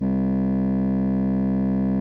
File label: Instrument